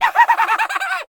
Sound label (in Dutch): Clown Lachen